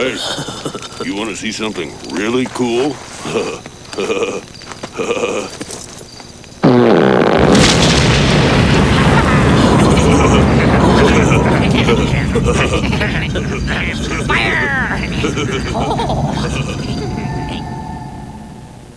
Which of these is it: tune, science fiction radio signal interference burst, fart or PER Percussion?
fart